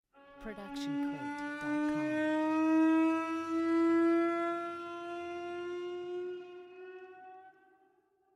Cello Bends Gliss; SoundsCrate

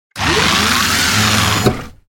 Robotic Movement; SoundsCrate

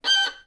Violin Screech Noise Short; soundscrate

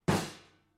Metal Footfall; soundscrate

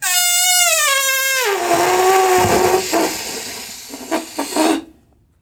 balloon deflate long

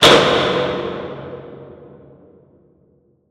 designer stutter medium boom hit; SoundsCrate

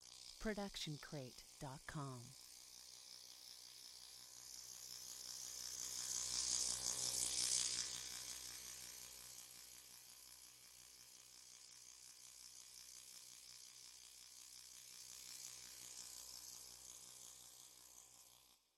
hovering drone; soundscrate

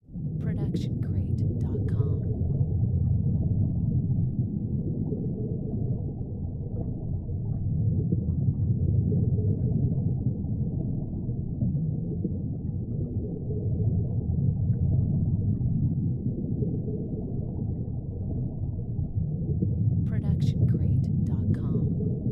underwater sub drone; soundscrate

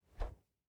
Fighting Woosh; SoundsCrate

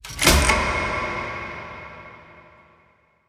switch large; soundscrate

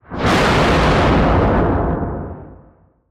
Wind Blast Explosion

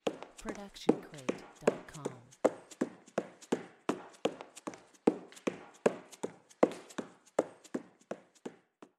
running wood floor

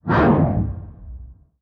Fire Woosh; soundscrate

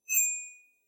Short Wind Chimes; SoundsCrate

